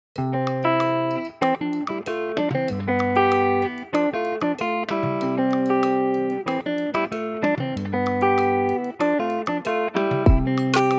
Afro Guitarscapes; Pressure Cooker Studios; Afro Guitarscapes Vol 1

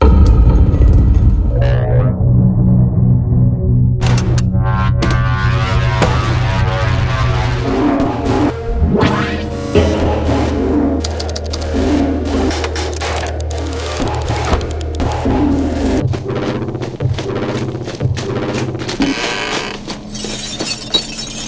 EPICUS, film foley sound fx; loopmasters

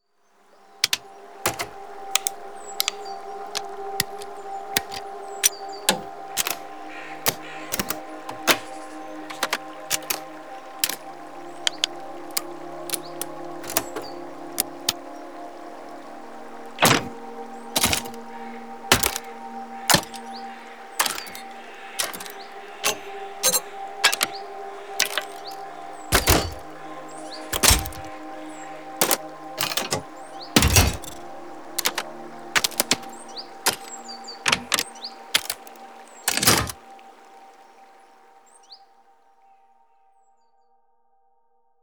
Tactile Buttons; sfxtools

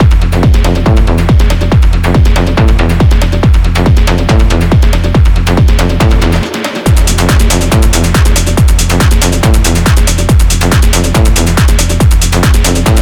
Berghain Techno; berghain techno 4 abstract sounds

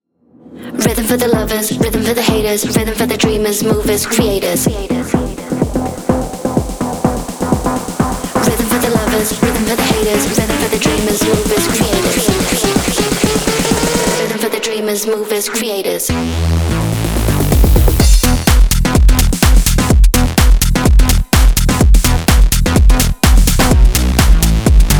Mainstream Tech House

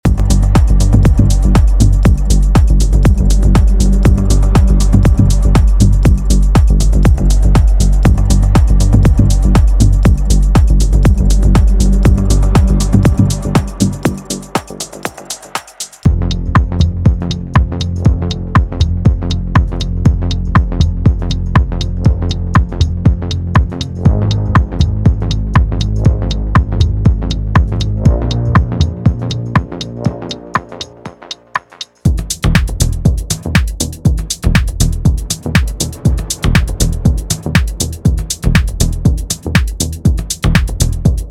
Melodic Tech House; mondo one shot 2 abstract sounds

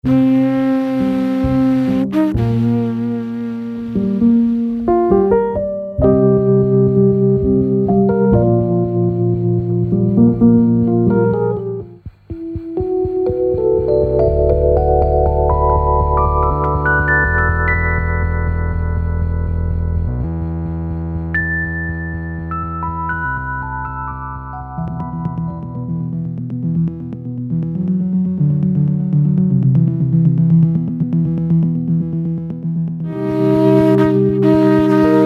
Night Vibes; night vibes vol 1 edition records

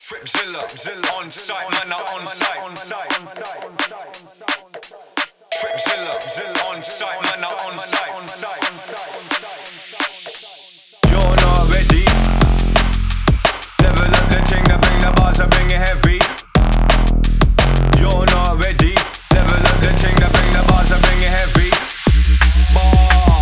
dnb vocals; tripzilla; rewind samples

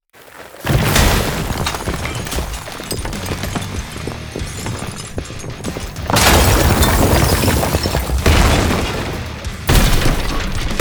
Destruction Hybrid; sfxtools

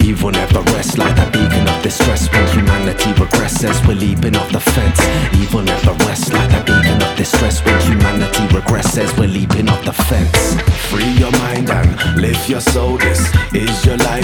Vocal Pack; renegade audio

